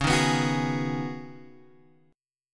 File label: C sharp 7th Suspended 2nd Suspended 4th C sharp down Guitar Open F; C#7sus2sus4; strummed